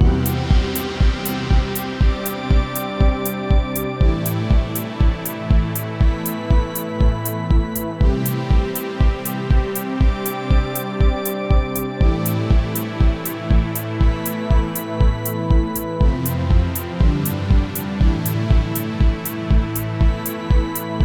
Ancient Game Open, epic battle

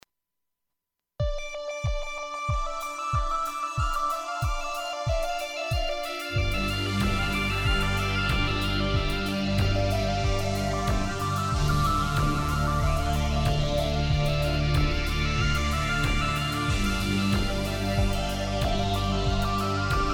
Sci-Fi Close

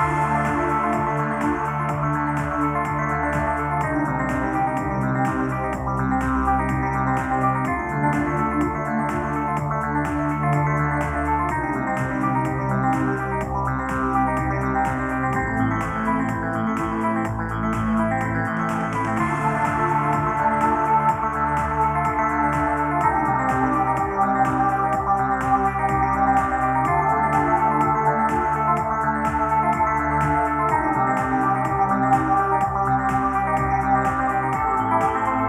LoFi, dark ominous

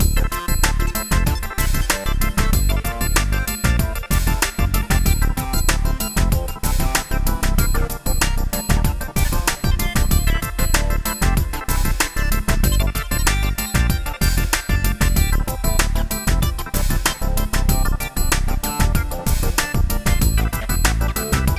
Digital Chatter; sci-fi

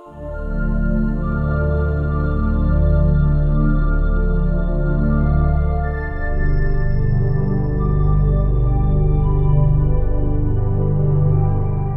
RPG Blues, fantasy

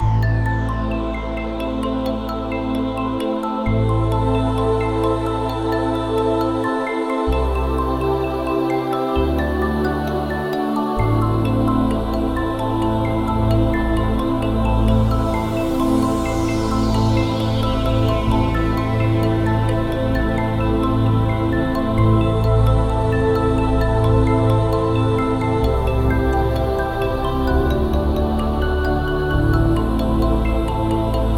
Mysterious Puzzle, puzzle music

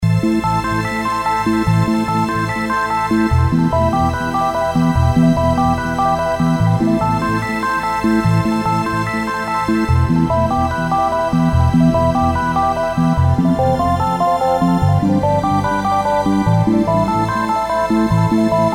Fantasy Game Background Looping

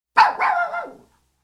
Small Dog Barking

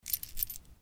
Coins

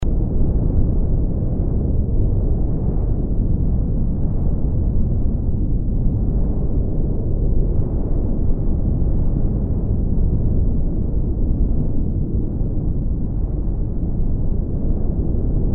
Wispy Wind

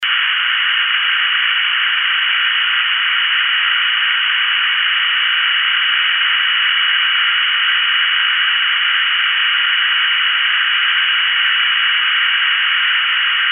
Telephone Static

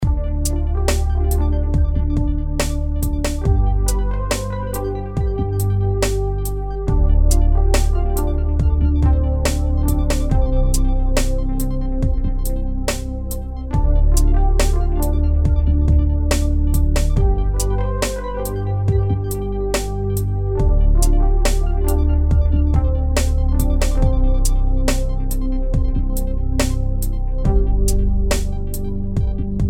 Corporate Ladder Looping

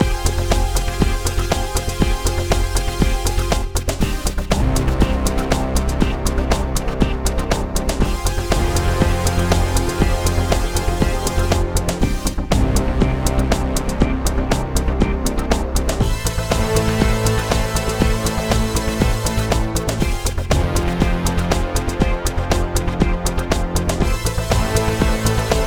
Disco Looping, funny quirky

